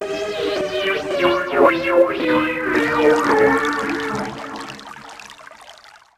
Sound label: Jingle Toilet; english training have fun improving your skills ds gamerip 2006